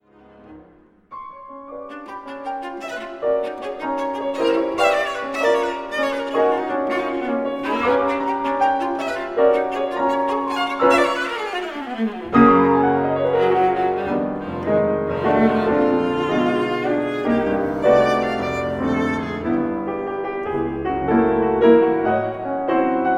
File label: Viola Sonata Vivace